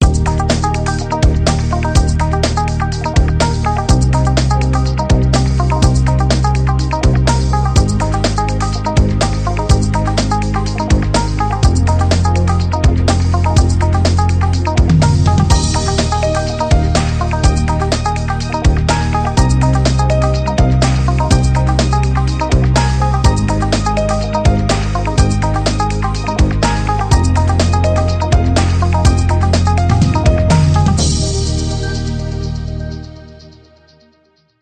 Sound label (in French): musique